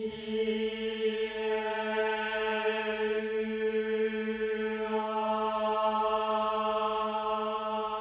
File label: Male A4